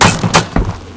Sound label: upgrade stone